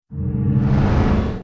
overstrike effect

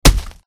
impact blunt

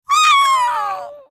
horde shroomie death; mobs

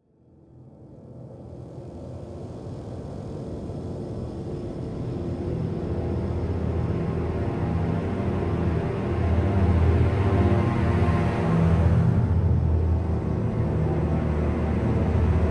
ambient

